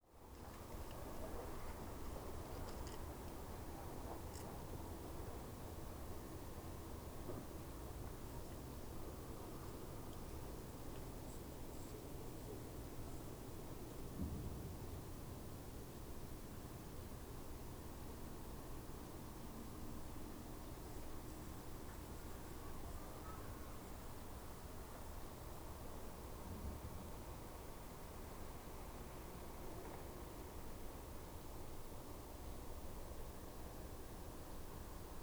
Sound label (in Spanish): AMBIENTE CHOZO; CHOZO GUILARTOS